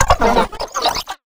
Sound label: robot